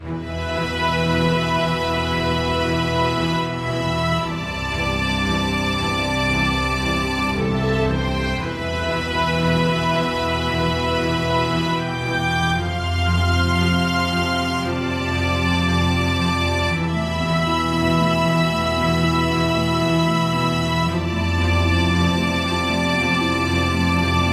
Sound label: tension loop; Partia 2; android game